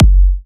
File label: Punchy Kick